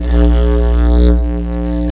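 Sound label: DIDGERIDOO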